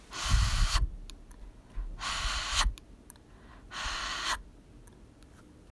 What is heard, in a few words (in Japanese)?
ハァーッツ！